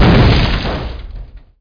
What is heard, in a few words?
small explosion; SpecOps2MPlayerDemo